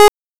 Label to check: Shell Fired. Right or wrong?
Right